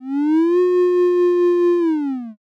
dog howl

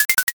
bone

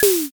umbrella

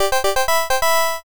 charge trumpet

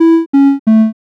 picosad

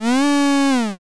cow

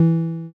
bass

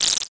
text anim

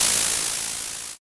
sparkler

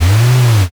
servo

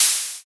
open hat

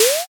draw card

